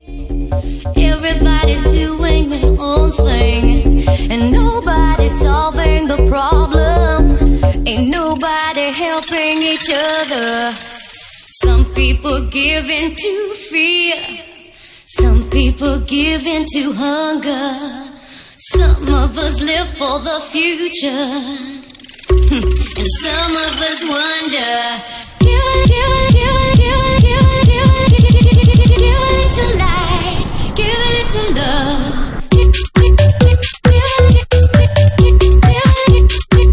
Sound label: Organ Remix